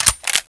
sg556 clip out; weapons; garrysmod

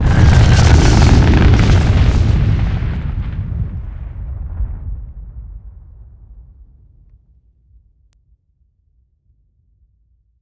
srb launch; garrysmod; gbombs

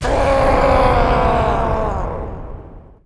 howl; cstrike; war3source; pitlord

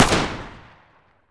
famas fire, weapons; garrysmod

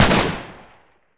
g3 fire; g3a3; garrysmod

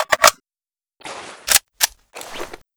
reload empty, weapons; ak74u; garrysmod

